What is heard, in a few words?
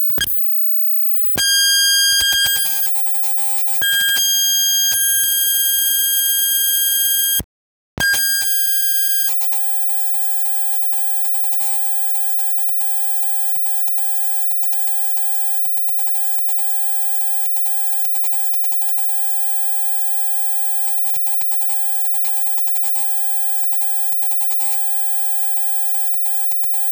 amp